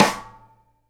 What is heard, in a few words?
SIDE STICK, Live Snares